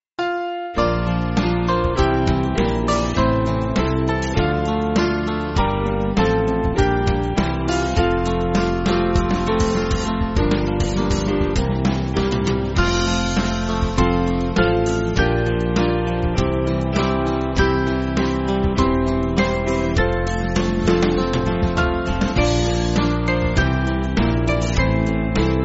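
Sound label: Band, Backing Files for Churches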